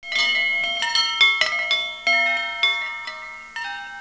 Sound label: chimes